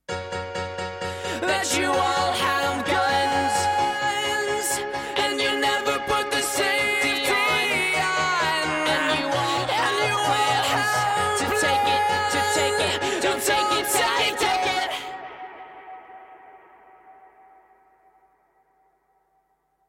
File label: Piano and B Vocals